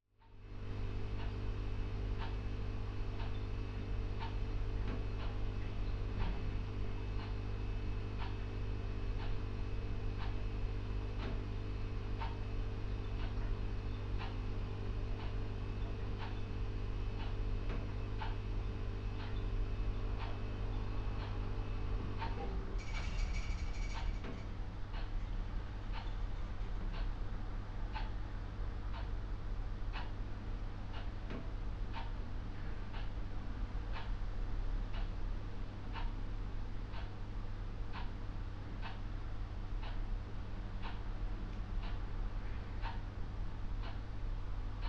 Ambience, Quiet Kitchen, Fridge Buzz, Clock Background, Fridge Switch Off; soniKSound; General Ambiences I